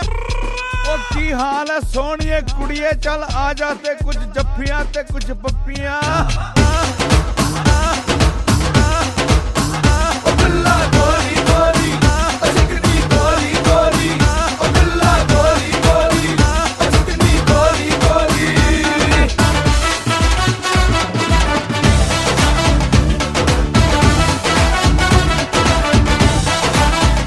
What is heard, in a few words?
Telugu Ringtone